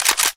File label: mp5 slideback; weapons; mp5navy